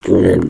Attack; Skullkin; creatures organic

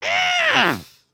Angry

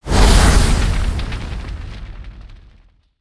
Fire Swing; Spinjitsu

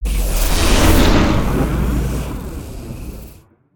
Lightning Tornado; Spinjitsu

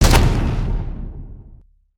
charge

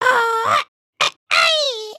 robot dance, emotes

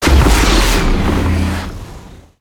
Spinjitsu Earth Tornado Start

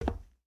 Foot Steel Boot Walk, footsteps

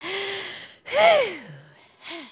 panic, emotes

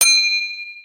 Modular Rebuild Bell

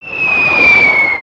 bomb whistle; weapons; aronwk collection; ndaudio

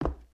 Foot Wood Boot Walk, footsteps

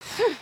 sigh

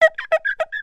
Cars Wheel Start

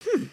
curious, emotes

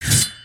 spear, weapons; aronwk collection; ndaudio

